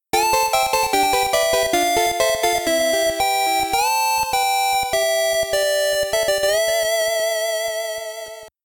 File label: game over; Insanity's Blade